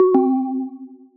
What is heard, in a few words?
critical battery alarm